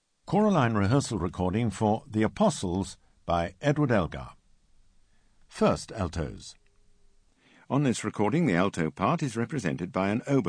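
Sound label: Alto; Elgar Apostles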